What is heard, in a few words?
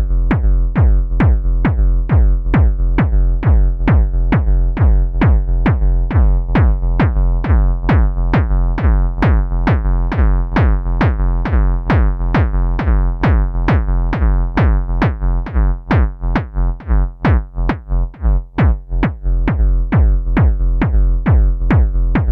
Ducking